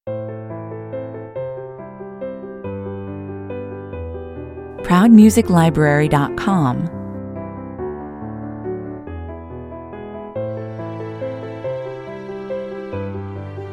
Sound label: no staccato strings